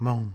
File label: moog